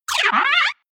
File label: SciFi Robot Dog Bounce Chatter Cartoon Digital Space; Sound Design; Science Fiction Sound Effects